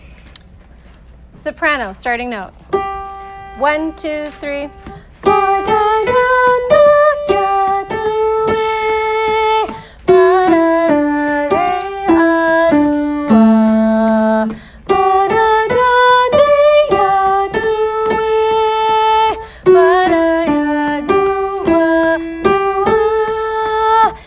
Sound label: even the pain soprano